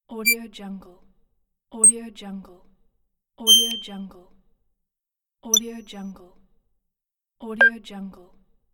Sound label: beeps for interface; livingroomclassic